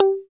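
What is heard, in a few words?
funky strat guitar; 90's Sample CDs; Club-50 - Foundations Roland; Funk & Mute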